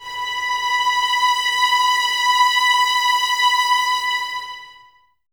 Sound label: violins B 5, String Ensembles, 23 Violins; Miroslav Vitous